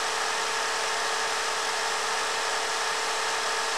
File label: unloaded circular saw